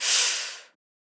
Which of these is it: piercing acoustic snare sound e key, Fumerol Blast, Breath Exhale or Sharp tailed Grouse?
Breath Exhale